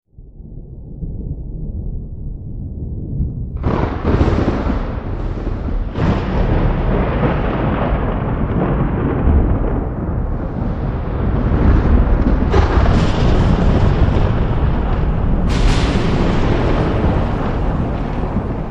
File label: epic thunder and rumbles no loops